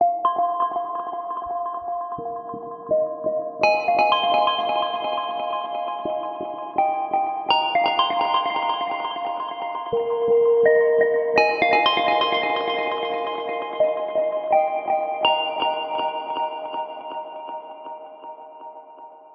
Tiles Rev

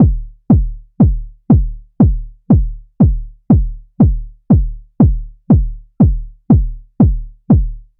Kick

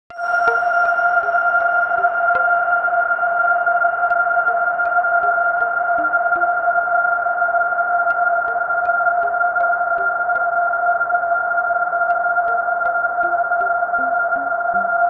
Heavenly Riser

